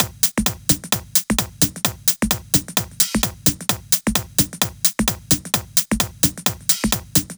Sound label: 130BPM Kit; Vengeance Samples; Comboocha Kit